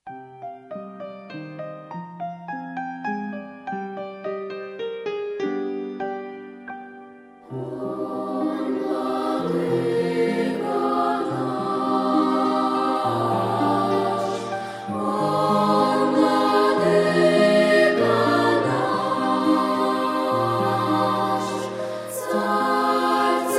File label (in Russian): гимн О Владыка наш